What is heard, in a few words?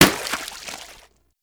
Water Balloon Impact